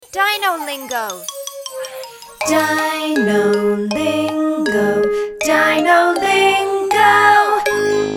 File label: JINGLE; DINOLINGO